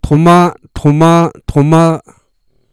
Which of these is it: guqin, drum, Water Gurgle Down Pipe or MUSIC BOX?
drum